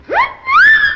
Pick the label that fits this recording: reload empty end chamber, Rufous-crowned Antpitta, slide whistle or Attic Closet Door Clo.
slide whistle